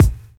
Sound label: rich highs kick sample a sharp key; kick drum samples